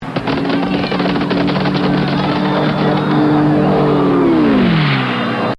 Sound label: p51 strafe